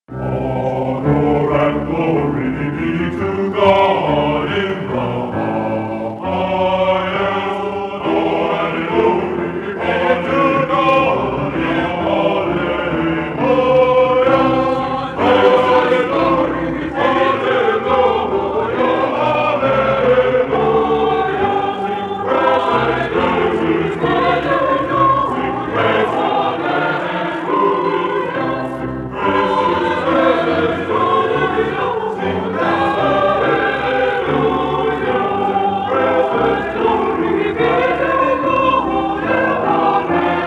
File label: 4 part Fugue, Choir; Bach - Honor And Glory; USC Pantheon Choir - Pgh